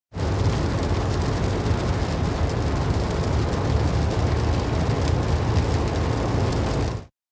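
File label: electronic plane fly in storm; anemoi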